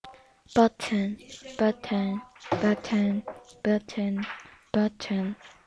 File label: button